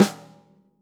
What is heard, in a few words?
snare snares on; JazzFunkKit